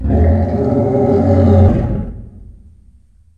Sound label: garg die; slender